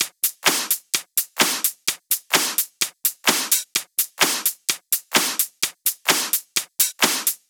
128BPM Drums; Uno; Vengeance Samples; Uno Kit

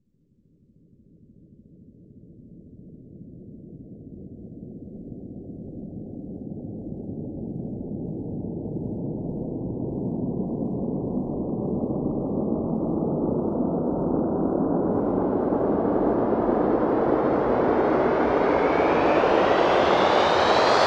Sweeps Long

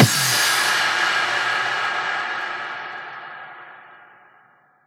Bassdrums FX, FX Kicks; Vengeance Samples

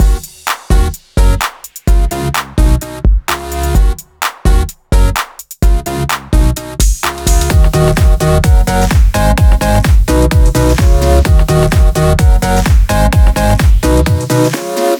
Mix, 128BPM; Resistance Mix

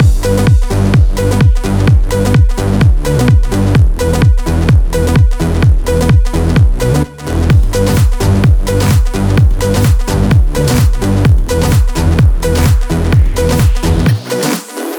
Mix, 128BPM; Jelly Mix

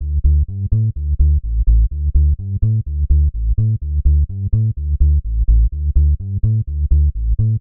126BPM Bass Root D; Vengeance Samples; VDE1 126BPM Inception Kit

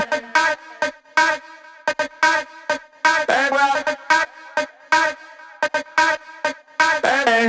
House Loops, Vocal Loops House 128BPM

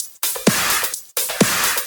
House loops 128BPM; Vengeance Samples

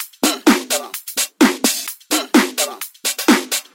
Minimal Loops

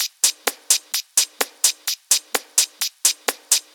House Loop, 128 BPM; Vengeance Samples

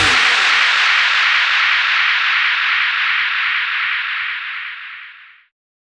FX Downlifter

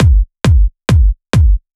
135BPM Kick; Drums & Perc; F#minor

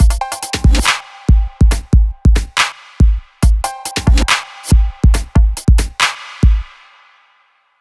drum loop, Dubstep; Dubstep Sample Pack

